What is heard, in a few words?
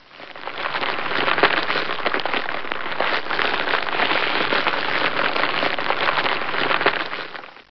FIRE, Cheesy Lo-Fi Sound Effects